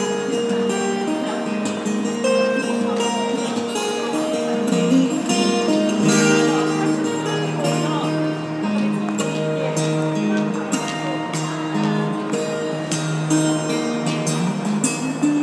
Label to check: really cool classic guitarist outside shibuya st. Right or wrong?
Right